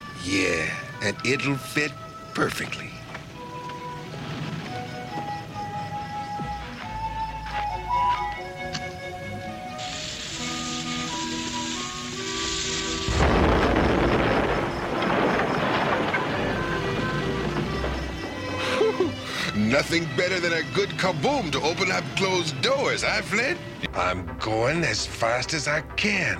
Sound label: zap